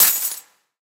gold spawn

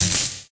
skill thunder

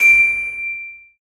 diamond spawn

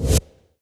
skill pickup

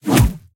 weapon hit

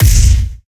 skill fireball hit

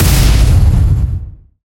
crit hit

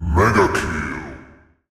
mega kill

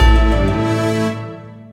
victory

